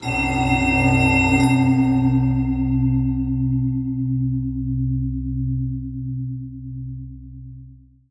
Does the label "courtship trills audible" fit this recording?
No